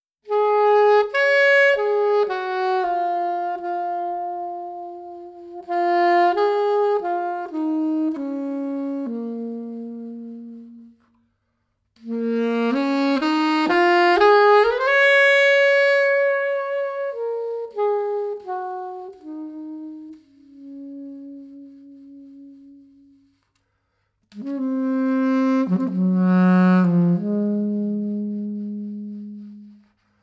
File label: Saxophone Introduction